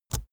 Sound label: keyboard laptop enter push release; kommunikation; computer